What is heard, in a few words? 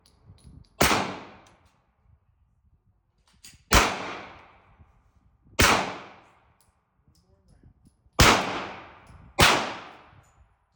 9mm Pistol Gunshots